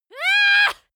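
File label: female attack ya long, screams; schreie; menschen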